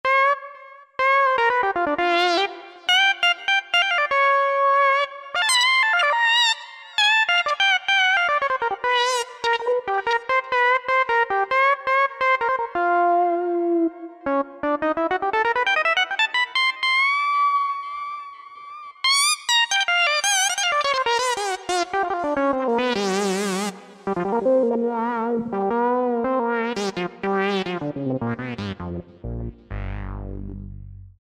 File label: FUNK LEAD; Akai SG01v